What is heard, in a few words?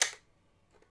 click